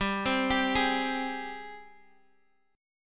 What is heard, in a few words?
Ab Major 7th G